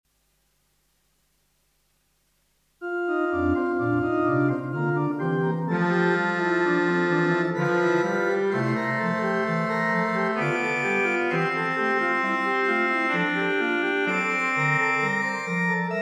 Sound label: New Organ; Gottes Sohn Ist Kommen